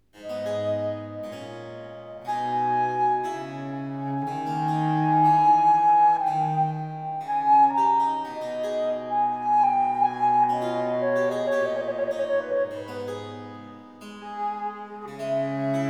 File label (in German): sonata fur flote und b c c dur adagio; johann sebastian bach; bwv 1033